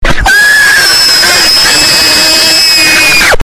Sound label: bear kid scream